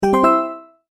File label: multimedia tone verified